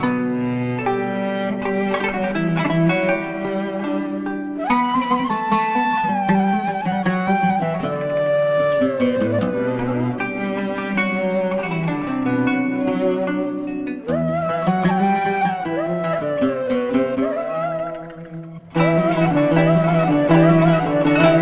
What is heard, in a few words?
Classical